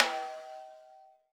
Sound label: RAW SMISS, Drums Acoustic; USB Soundscan vol.10; AKAI; 90s sample CD; RAW KIT 1